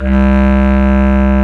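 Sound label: bass clarinet, Orchestral Winds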